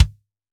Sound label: Kick Hard; Trials Kick